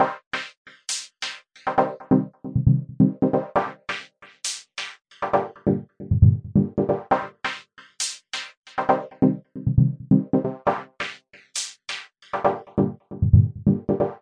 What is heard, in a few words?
135 filtered stab synth; SYNTH AND ELECTRONIC LOOPS